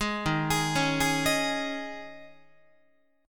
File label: D sharp 7th Suspended 4th, Guitar; D#7sus4; arpeggiated